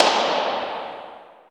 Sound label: HALL CLAP; drum; CLAPS+SNIPS